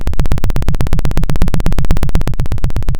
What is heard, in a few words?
Sound of a helicopter